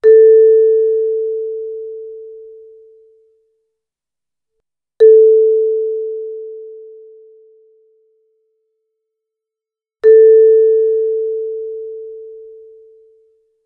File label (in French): percussion